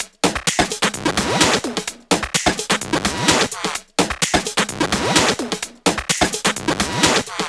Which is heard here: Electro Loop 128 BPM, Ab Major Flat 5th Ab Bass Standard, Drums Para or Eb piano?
Electro Loop 128 BPM